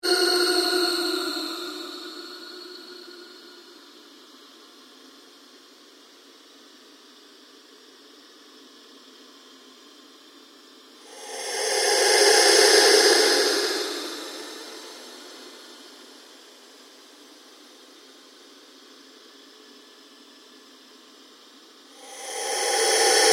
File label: Ambient Drone Slow Sweep; Sound effects created by manipulating other sounds; Sound Design